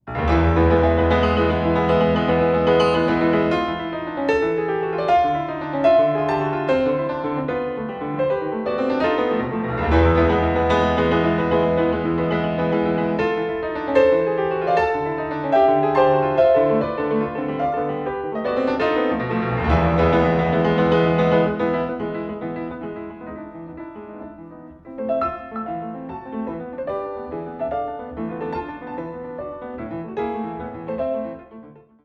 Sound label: Piano sonata; Prokofiev